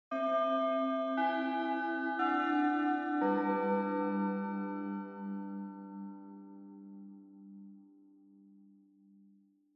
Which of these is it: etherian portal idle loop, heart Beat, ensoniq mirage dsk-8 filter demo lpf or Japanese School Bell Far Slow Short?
Japanese School Bell Far Slow Short